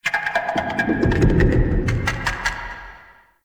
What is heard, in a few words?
Distant Clicking; Ambience; Warframe; Duviri; Murmur